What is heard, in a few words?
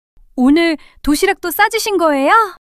surprise